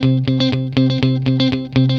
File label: guitar, stringed instruments